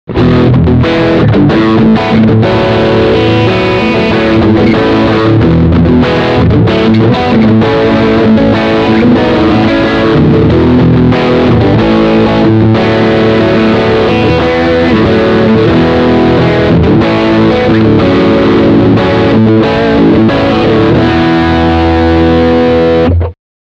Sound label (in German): Riff beide Pickups